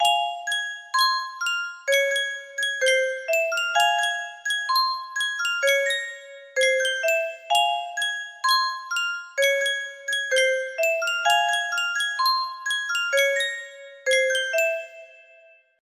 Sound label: Yunsheng custom tune music box